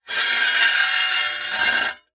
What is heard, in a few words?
Impact Spade Dragged On Concrete